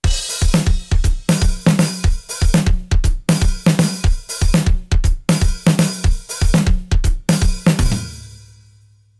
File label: beat